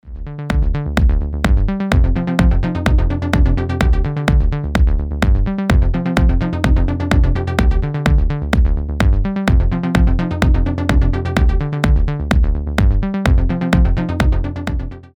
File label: waldorf rocket arp